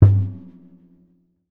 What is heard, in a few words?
Toms; One Shots; Touch Loops; CosmicFunk